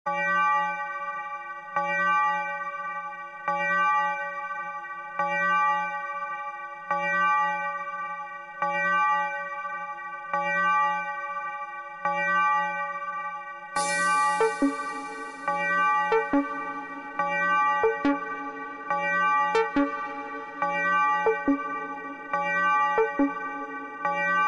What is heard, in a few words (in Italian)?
Campane